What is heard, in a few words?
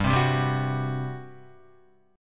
G Major 7th Suspended 4th, G, down, Guitar; GM7sus4 strummed; JGuitar